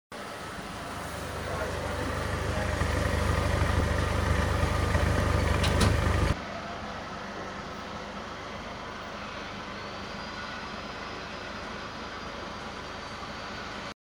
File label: idoling issues saturn after intake gasket change; saturn s series sedan